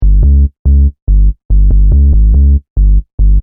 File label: Techno-Trance Sub Bass Loop In G 142 BPM; Bass Loops